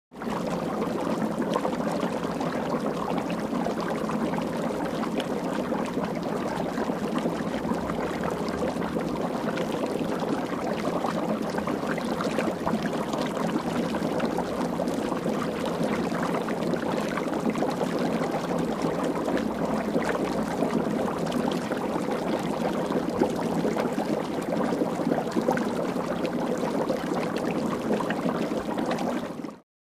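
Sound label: Bubbles Cauldron Liquid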